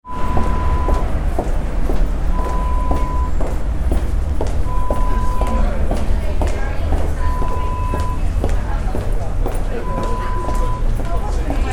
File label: Footsteps Subway